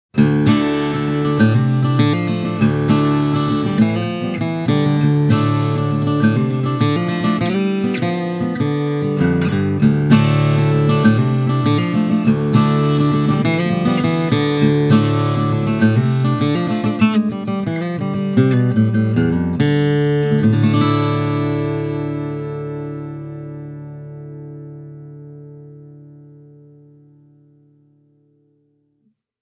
URBAN single mic

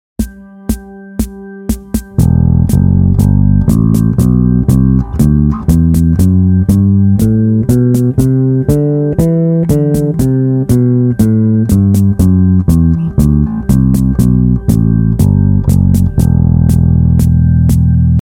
Aeolian Mode, bass; minor scale; two-octave exercise; open E and A strings; Bass guitar tablature; a bassist's guide to modes

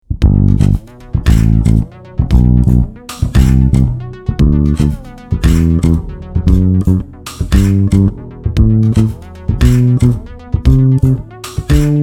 Three octave scale; Yamaha

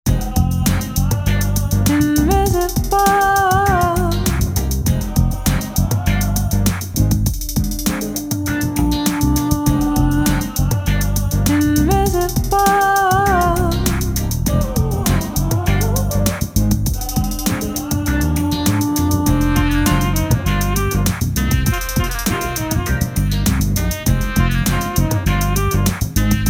VOX AMBER

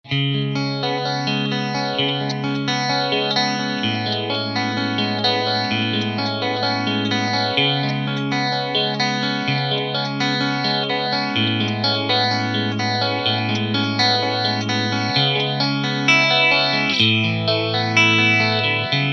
PHASER

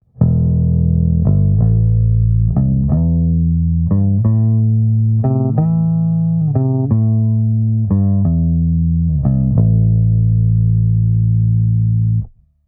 bb1200 full rolloff

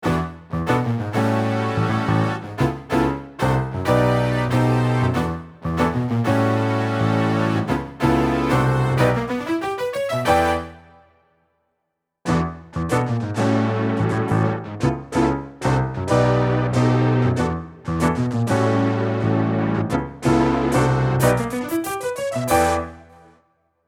Piano and Brass

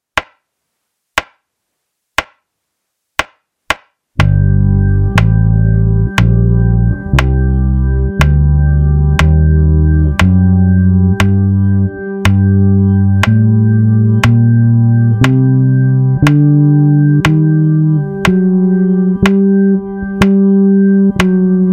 Harmonic minor, bass; a bassists guide to modes

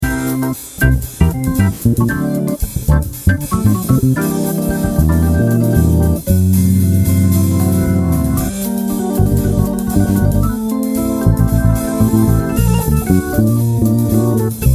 Lydian b7 groove, bass; a bassists guide to modes

